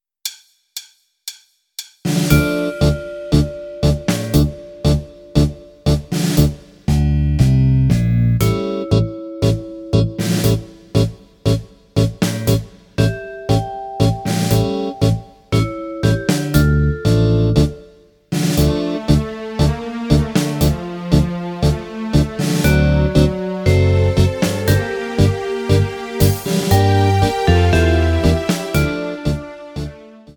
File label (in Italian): Medley Tango, BASE; El Choclo Mix